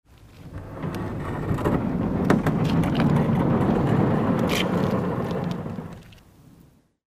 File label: sliding a metal barn door open